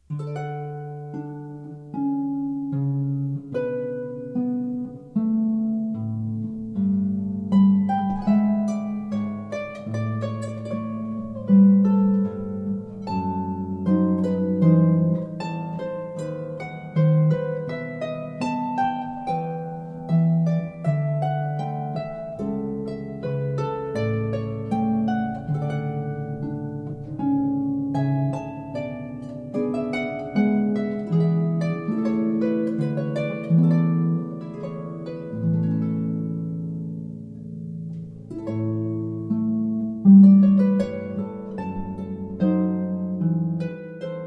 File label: air on g string, harp